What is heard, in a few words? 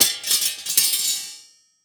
Impact Metal Bars Hit Cement Multiple Bounces